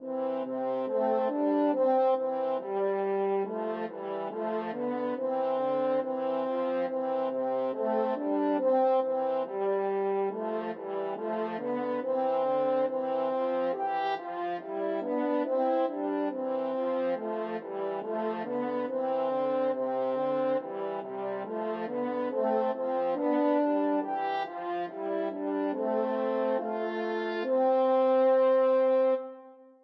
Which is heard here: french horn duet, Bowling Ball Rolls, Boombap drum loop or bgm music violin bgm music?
french horn duet